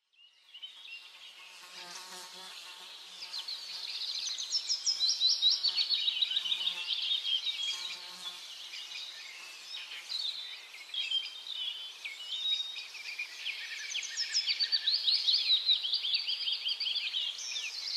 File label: Nature Birds